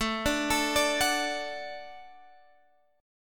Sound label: D Major, A